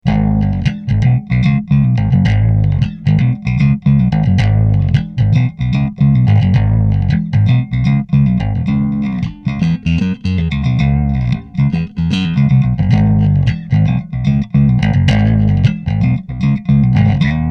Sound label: riff